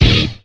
blare, Stabs

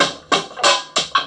filtered dust break, Drum loops

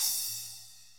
soft, cymbals

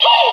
ho, ooh ahh, ho hoo woo, Voice

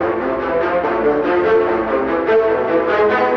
strings, Sad Loops

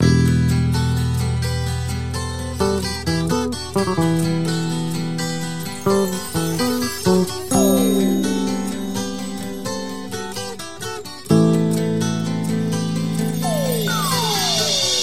guitar, Loops

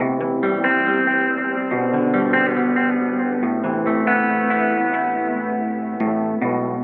guitar, Loops, Sad

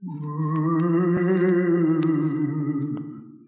moan

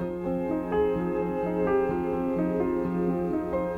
piano, sad loop

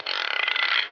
guiro

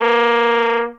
sax; Notes; Winds

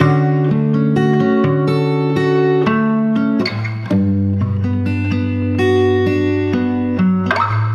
guitar

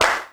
clap snap; Snares

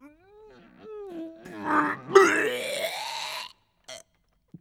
vomit

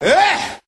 eeeyah male, voice; ooh ahh

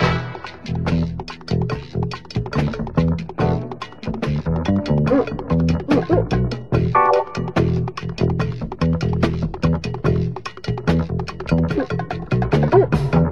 guitar headshots with drums; Loops; Phonky

